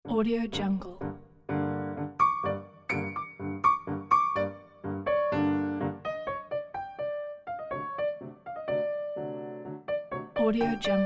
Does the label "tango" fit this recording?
Yes